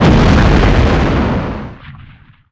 SHIP EXP; ast miner

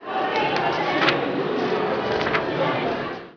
college, Ambient; Revista CD Expert 45; ZEUS

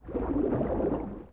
swim stroke; DAoC

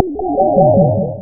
Resist Magic; Realmz 4.2.2

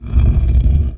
stone; desert

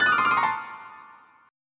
time btn

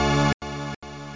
Lamp; PlayPac

